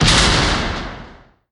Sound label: Gear Teeth Hit